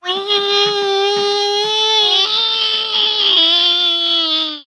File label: flying bird small bird death cry